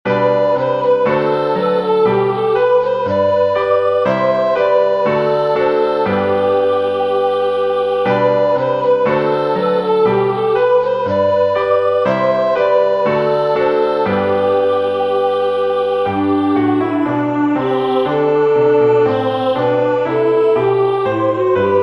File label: lent acclamation; Christ became obedient; easter